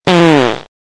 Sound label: fart